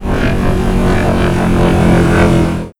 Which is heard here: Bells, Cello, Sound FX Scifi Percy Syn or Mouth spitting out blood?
Sound FX Scifi Percy Syn